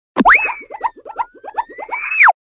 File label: CARTOON SPIN